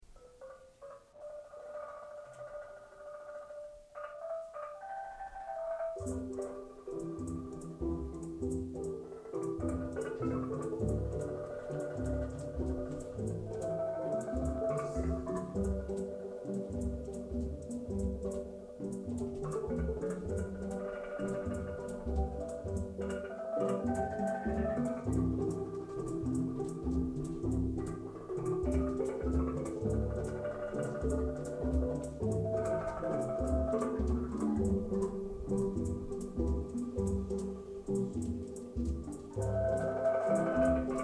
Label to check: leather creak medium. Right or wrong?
Wrong